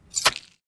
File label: bloody knife stab